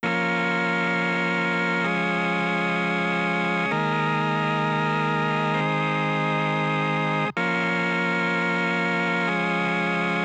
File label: Organ; Synths and Organ